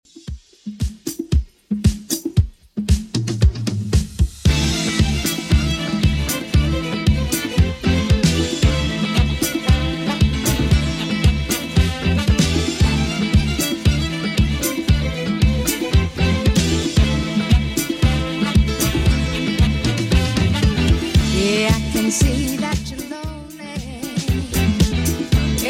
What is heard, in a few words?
80s Redrum 115 bpm CLEAN